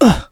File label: exert; blindside